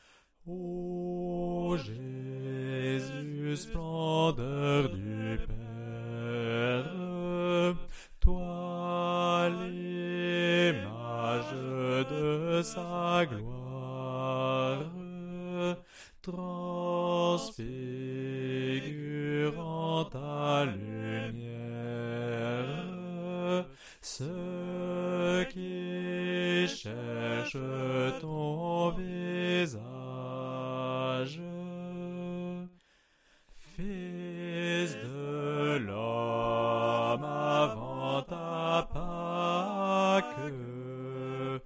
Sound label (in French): ensemble B; Basse